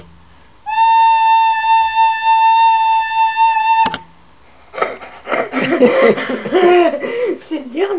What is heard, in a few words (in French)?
la flute